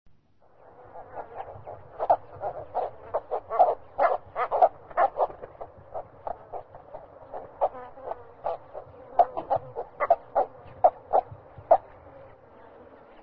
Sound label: Cattle Egret